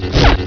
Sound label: saber hit; cstrike